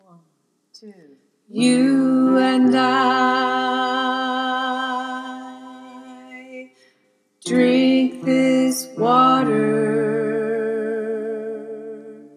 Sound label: Tenor, choir